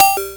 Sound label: blips, opl3